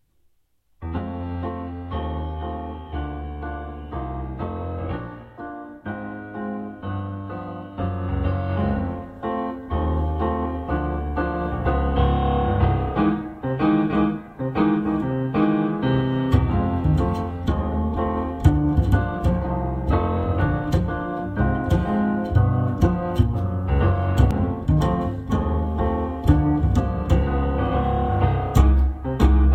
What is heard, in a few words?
Blues; jazz